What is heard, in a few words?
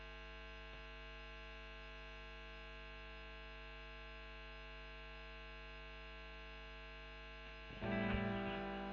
E.GTR Royer OD